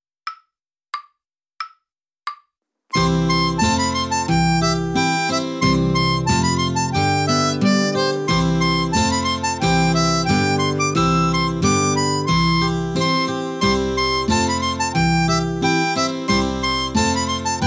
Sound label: Polka, Harp